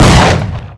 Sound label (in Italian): sparo, armi